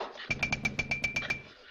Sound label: chomping sound